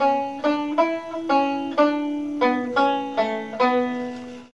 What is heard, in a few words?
Scary Banjo